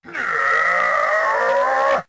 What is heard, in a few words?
charge